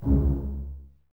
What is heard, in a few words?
BSS C#2 Pizz, String Ensembles Basses; Miroslav Vitous